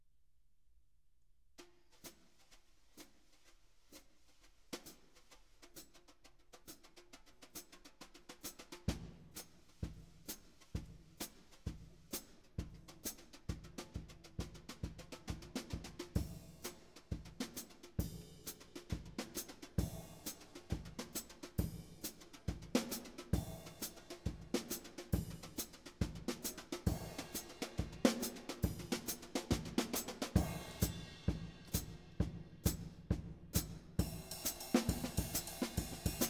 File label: Dynamic Drum Test